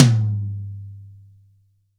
hi tom G key, Royality free tom drum tuned to the G note; Loudest frequency: 1176Hz; tuned drums g key